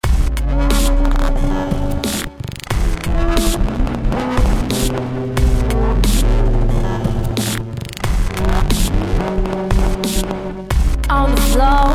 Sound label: Glitch Art; Ueberschall